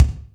DRY KICK, ACOUST KICKS; Greytsounds

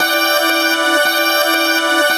RINSING, AMB. WASHES; Spectrasonic Distorted Reality 1

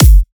drum kick freshness; Virtual Riot; Drum Hits

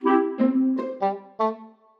orch sting; musicradar; cartoon caper

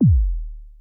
kick, Roland MC-909

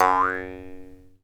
JEWS HARP, Earth Tones; Jew's Harp & Spoons

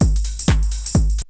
Bite Size Beats

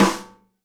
SNARE RIM, Live Snares

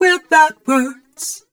f mel c, fem Vocals

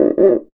CUICA; ILIO

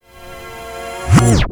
backscratch, scratches; Zero-G; Freak Beats

